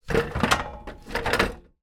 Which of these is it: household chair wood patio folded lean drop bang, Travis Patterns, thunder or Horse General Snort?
household chair wood patio folded lean drop bang